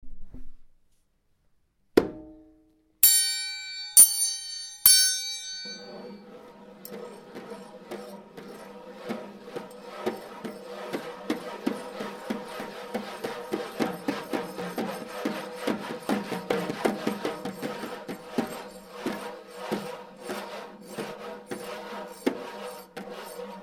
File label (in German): Eisenbahnmusik